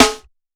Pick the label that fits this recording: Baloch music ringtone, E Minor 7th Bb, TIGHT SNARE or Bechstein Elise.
TIGHT SNARE